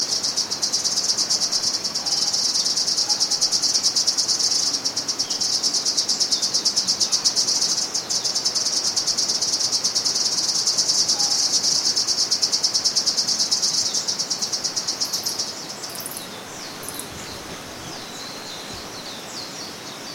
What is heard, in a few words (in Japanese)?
kuroiwazemi